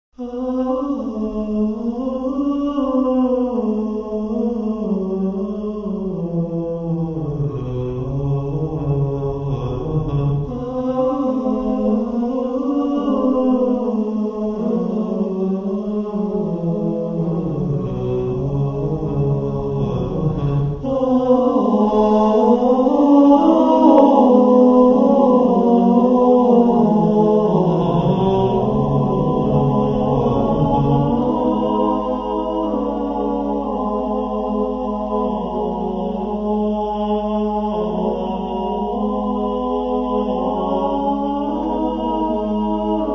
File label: vocal